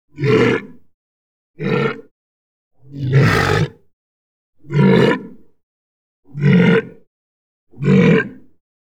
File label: walrus grunts; walrusgruntsmultip; Kaip kalba jūrų vėplys